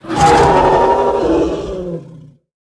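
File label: Fleethoof Pearltusk Death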